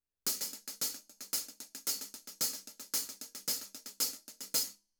14 HH CLOSED, cymbals

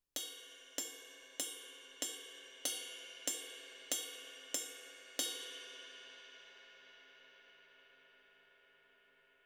20 CRASH BELL, cymbals

